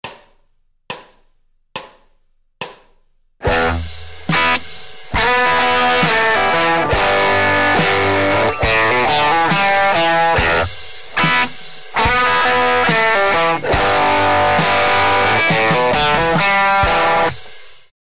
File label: easy, Guitare; Les plans de gratte